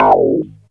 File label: tuners